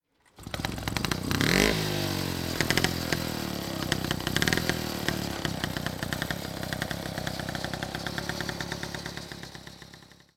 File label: Wolf